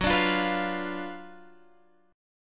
Gb Major 7th Suspended 4th Sharp 5th, Guitar, Open F; GbM7sus4#5 strummed; x,13,14,12,14,12